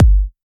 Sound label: KICK, DRUM HITS